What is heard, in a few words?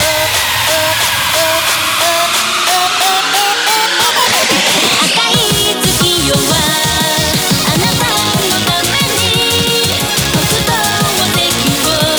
Bgm Reach; Mahjong Fight Girl